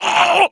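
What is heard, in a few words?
voice shock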